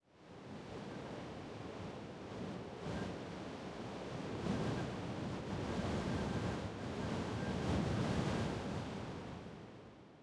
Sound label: ambient; World of Tanks